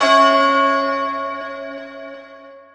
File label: Key bell; Luxembourg Zwee